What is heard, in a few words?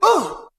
uh